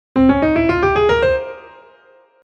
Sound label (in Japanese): スパニッシュスケール音源